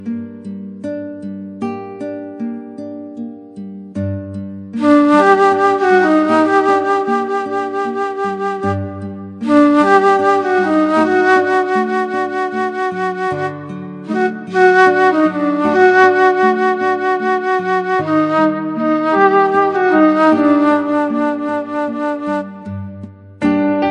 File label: Melody